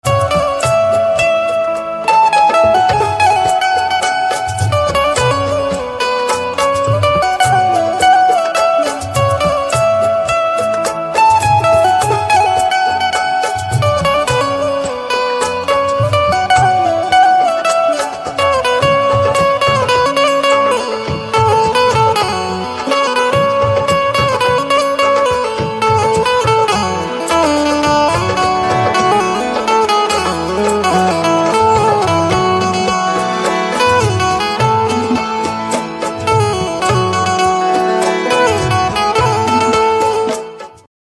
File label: Banjo